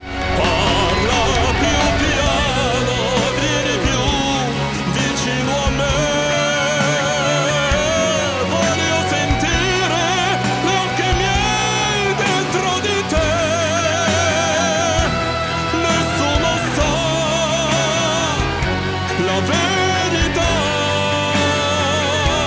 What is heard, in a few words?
Piano